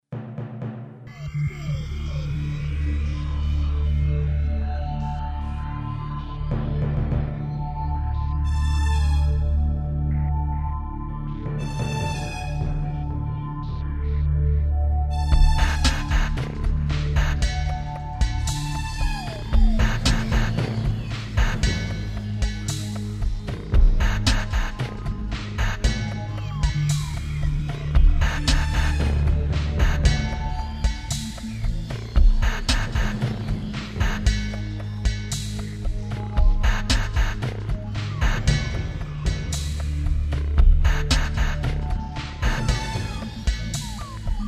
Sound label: drum remix